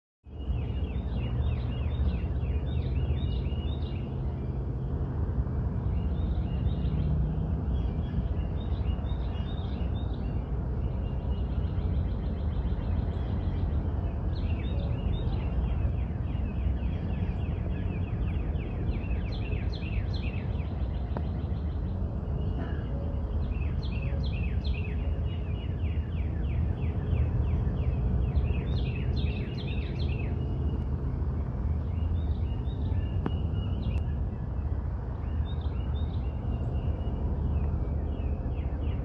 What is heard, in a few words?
birds singing by my front porch